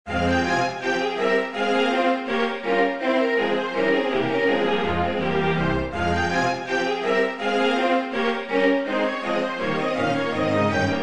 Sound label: Bourree in F minor